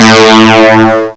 SYN BASS; M8; CMI Factory

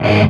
chunk G#, heavy metal; Greytsounds

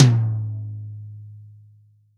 REAL DRUMS POP KIT; Best Service ProSamples vol.43 - Real Drum Kits

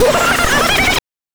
VOX LAUGH; NES DPCM; MIDINES

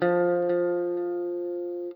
NOODLE LONG, Guitar & Bass; Quantum Leap; 90's Sample CDs

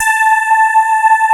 STRIA, Twisted Textures; STRYNGI

